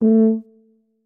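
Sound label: tuba sounds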